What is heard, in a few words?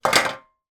household grater metal down on surface; zapsplat